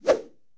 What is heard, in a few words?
Swing kick sound